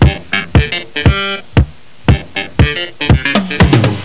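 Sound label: SYNTH RHYTHM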